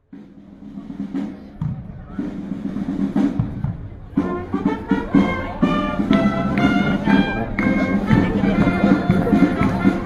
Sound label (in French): Musique; Belle Helene